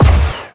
energy torpedo fire; weapons; Stellaris

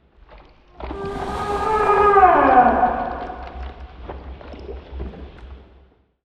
elder tiyanki combat transition moving; Stellaris; distant stars

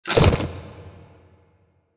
kaiju adult chomp; biogenesis

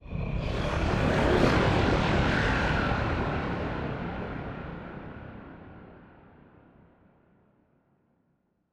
ship titan move; Stellaris; machineage

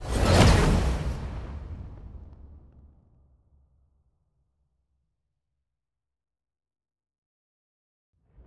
science ship move; aquatics

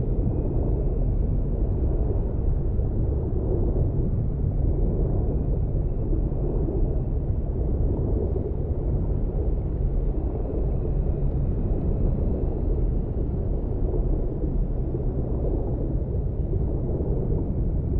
ship destroyer idle; aquatics

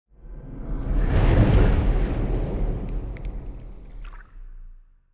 colossus move; aquatics

